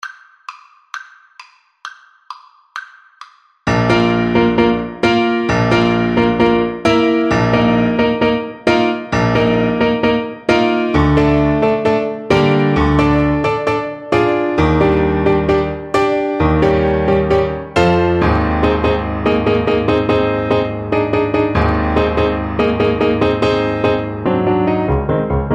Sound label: romanian folkdance, Trumpet; bartok